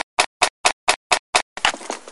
source loading stutter

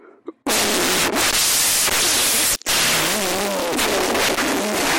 really loud rubber chicken

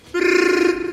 stylish brrr

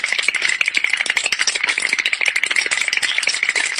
shiver sound; spongebob

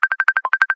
messenger ringtone

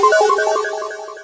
disappear

